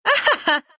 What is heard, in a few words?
laugh, ru; dota2